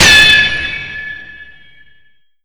sword clash

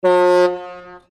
race signal

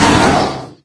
pain chest, wraith; doom3

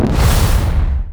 jetpack extinct, power armor; powerarmor t51

